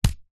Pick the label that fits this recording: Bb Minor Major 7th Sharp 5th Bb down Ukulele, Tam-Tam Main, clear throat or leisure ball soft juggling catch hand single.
leisure ball soft juggling catch hand single